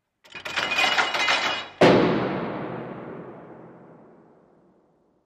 Large Metal Door Open, slam

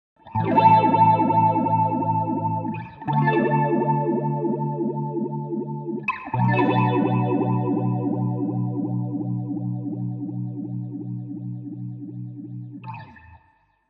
Elect Guitar Vibrato, electric guitar

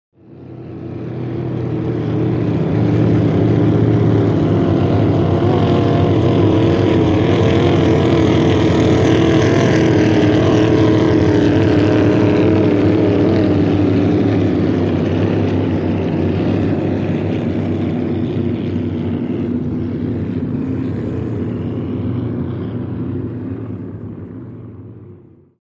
Hydroplane By Light

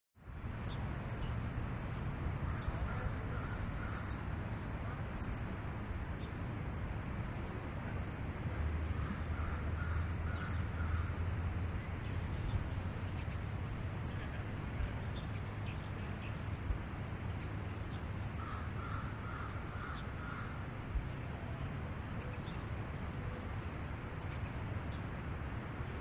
Park Suburban Birds

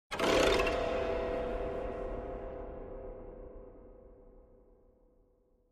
Piano Strings, keyboards piano

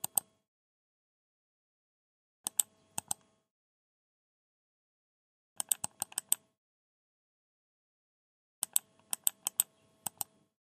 Computer Mouse Clicks

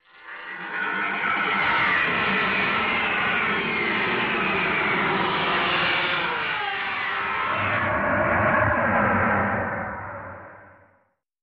Metal Nightmare Scrape, scrapes

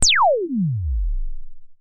laser; lasers

